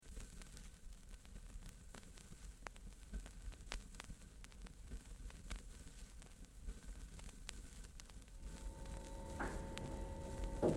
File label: Interior - Run with stop & start in cab of stock train, Tube; 1959 stock